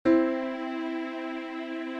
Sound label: C Maj C E